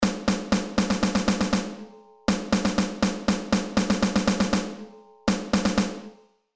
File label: Rhythms Snare Drum